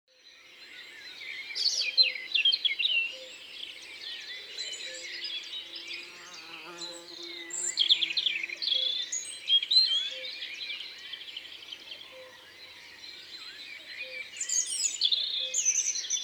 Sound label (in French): Rougegorge familier; Concerts naturels de l'Isère; Studio Les Trois Becs